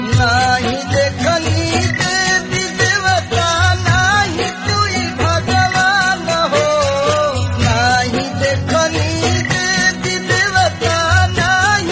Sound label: Bhakti